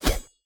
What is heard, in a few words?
Slashing Long